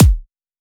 Kicky

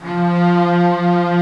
ORCH F2, Strings

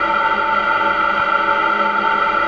INHALE; Distored Reality

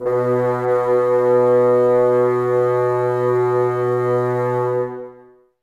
Bassoons B 2; Miroslav Vitous - Woodwind Brass Ensemble

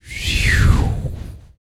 VOX FX, BREATH FX

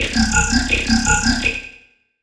bubbles, bass

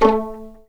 A3 PIZ F, VLNS PIZZ; East West; The Ultimate String Collection

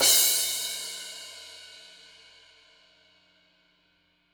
CYM; Bob Clearmountain Drums I

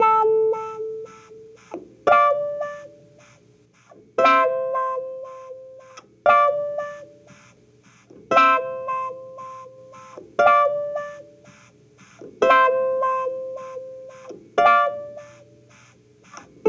Cruisin' Disco Clavinet, 70s Analog Funk

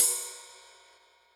Ride Rock, Yamaha DTXpress Cymbal

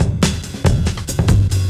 Beat Style, 140bpm, breaks

